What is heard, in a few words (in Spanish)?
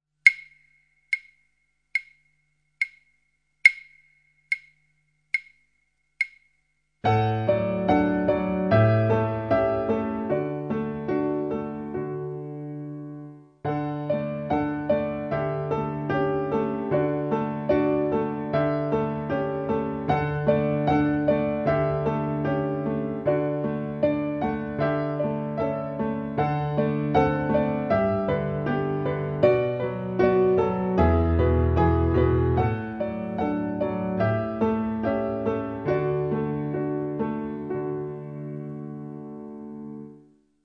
Brisa de verano piano